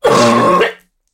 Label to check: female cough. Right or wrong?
Right